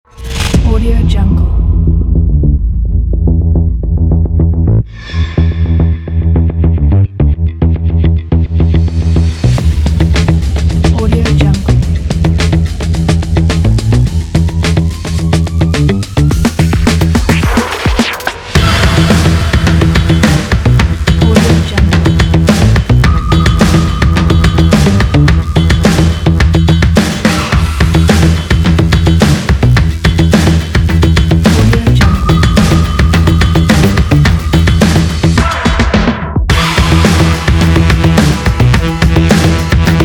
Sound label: extreme sport promo pack; diamond tunes